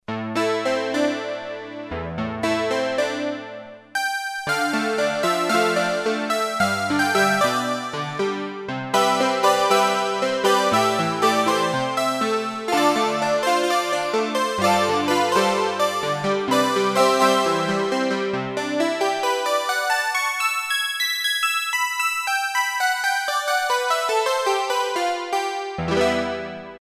Harpsichord 1, Roland Juno-60; Factory presets

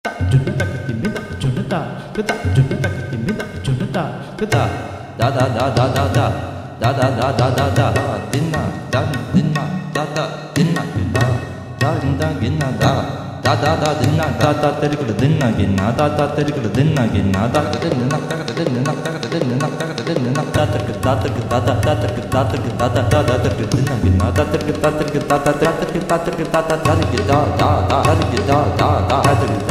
Indian Drum Song; Spectrasonics Heart Of Asia

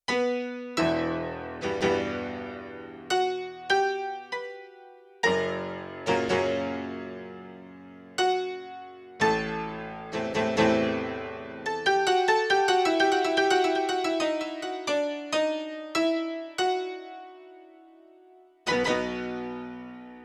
Wide Octaves, Grand Piano; Yamaha TX16W; Yamaha CFIII Grand Piano

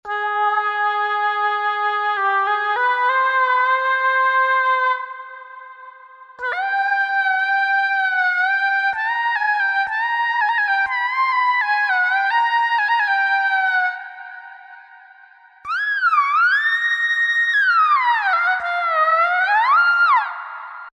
Eastern Lead, Roland V-Synth; Factory Patches

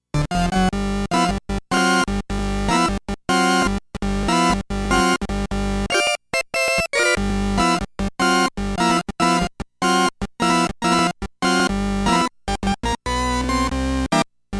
Combo Organ

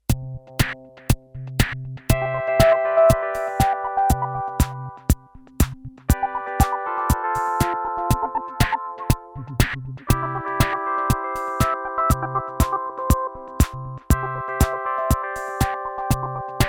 VeloArps; M-Audio Venom; Multi

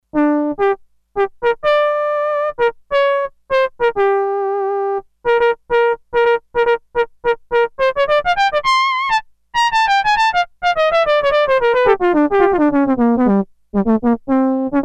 Cornet, Sequential Circuits Six-Trak; Factory Programs

